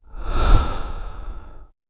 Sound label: a gentle breath out